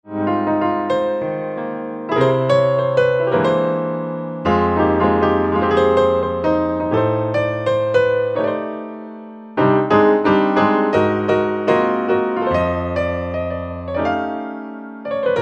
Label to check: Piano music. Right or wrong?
Right